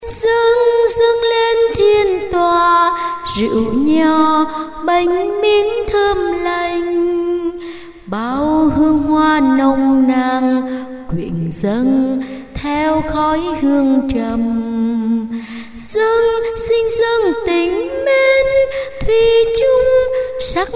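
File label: soprano tenor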